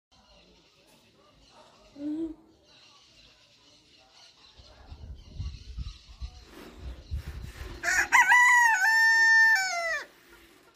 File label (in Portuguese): galo sedoso cantando